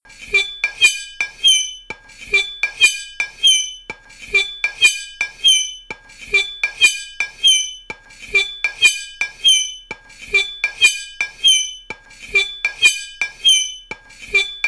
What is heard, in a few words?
knife bottle